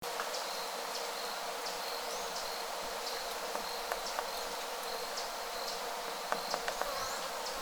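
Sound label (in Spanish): Picaflor Vientre Negro, Anthracothorax nigricollis, Black-throated Mango; cantos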